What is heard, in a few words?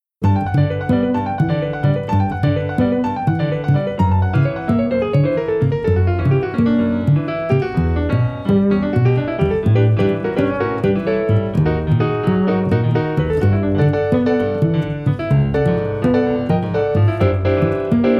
solo cellos; Spain